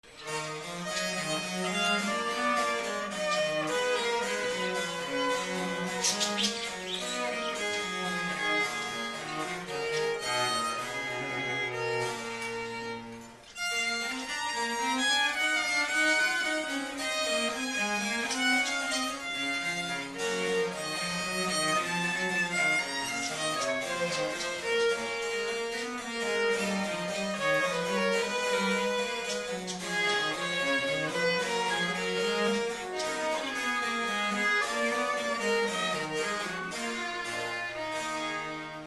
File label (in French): sonate violon clara